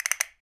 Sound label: PER Percussion